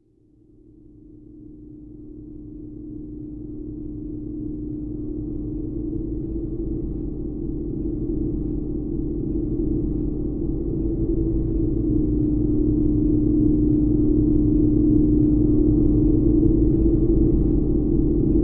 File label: Metal Field, dark ambient